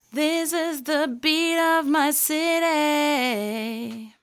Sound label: Vocal Low Cut Top End ON; WA EQP